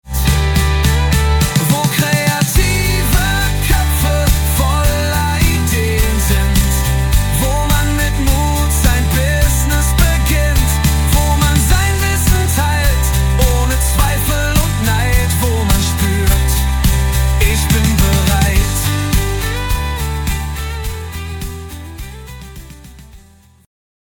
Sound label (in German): Pop Songschnipsel; Mysoundbranding